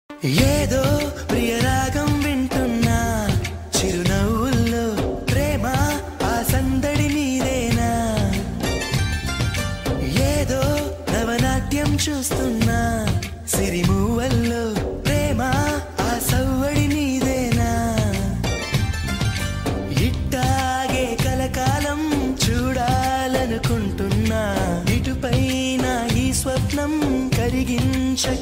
smartphone ringtone

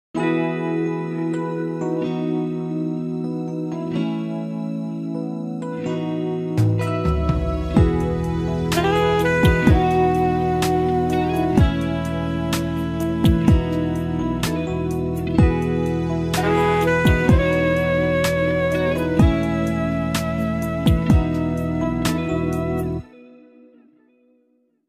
retro love Samsung Galaxy S21 ringtone

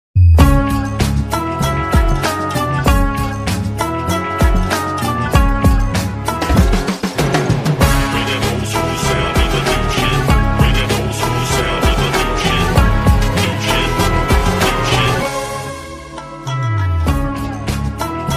ringtone bgm vibes

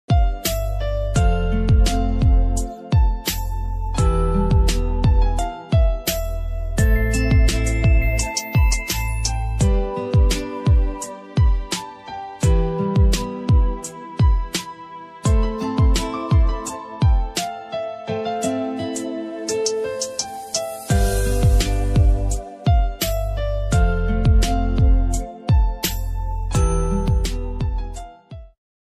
instrumental ringtone song punjabi song ringtone

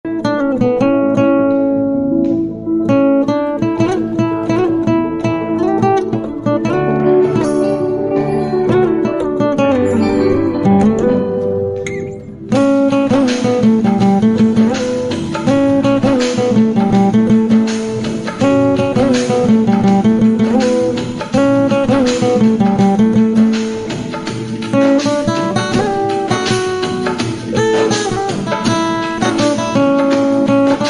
raksha bandhan instrumental song; rakhi songs; bhai behen

